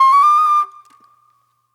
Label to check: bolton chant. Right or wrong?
Wrong